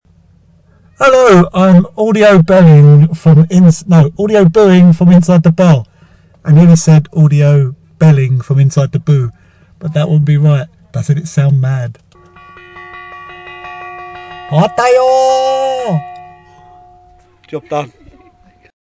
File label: bell